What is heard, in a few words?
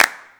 Clap; pitchglitch